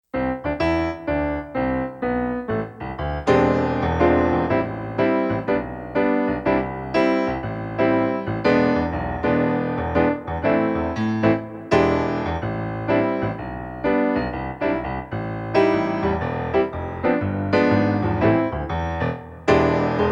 background joshua fought

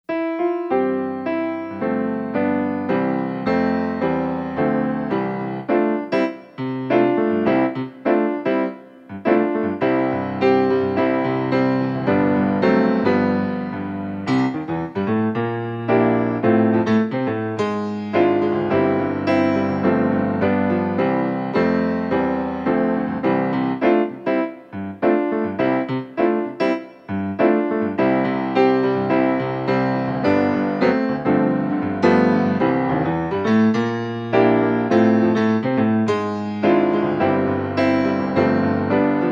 background when the saints, background music

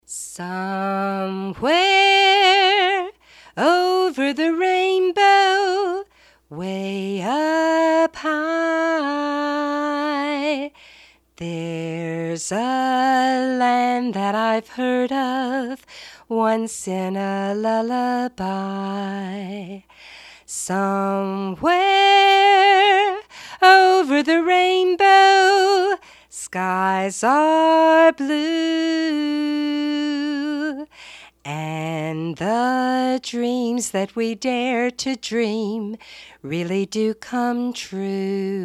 rainbow song

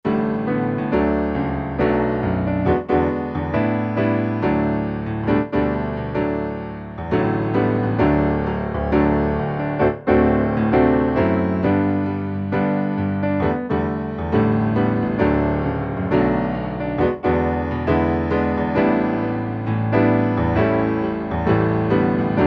background peace

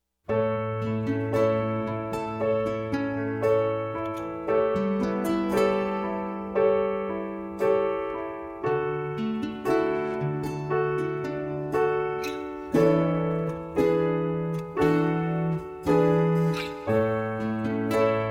background

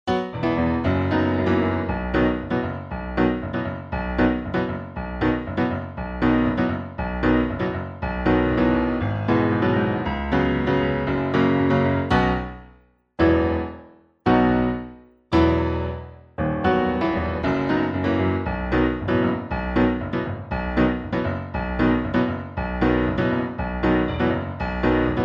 background mighty god

